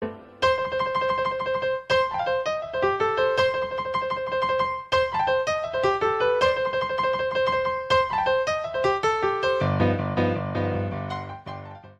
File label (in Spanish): Sonido piano